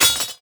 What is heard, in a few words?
warrior drop sword